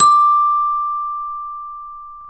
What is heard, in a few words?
CEL, ORCH PERC